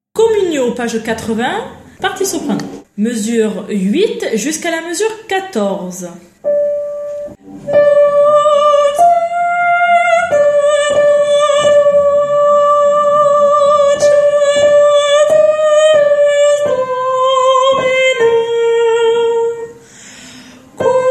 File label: Soprano; Requiem; Lux Aeterna; Mozart